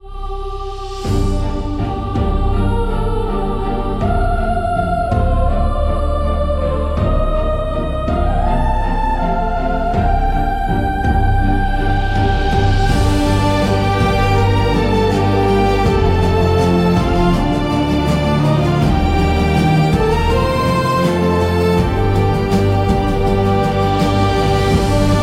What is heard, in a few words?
medieval battle